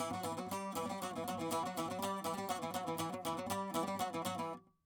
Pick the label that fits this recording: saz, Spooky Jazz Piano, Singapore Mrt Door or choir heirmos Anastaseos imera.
saz